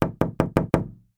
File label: knocking five times on wooden door